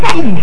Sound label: whap oof